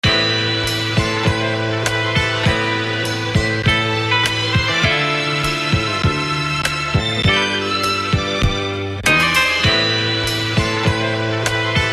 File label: instrumental